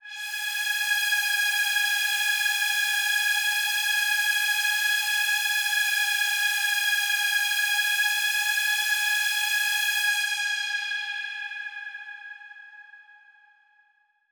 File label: Big Pad G#4; KG MegaSaur